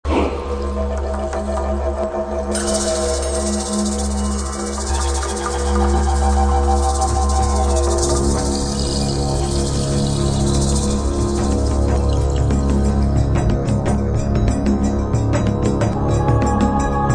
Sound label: Main Menu Music